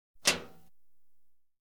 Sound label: Audience Applause